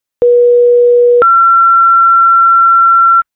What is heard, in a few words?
chief, tones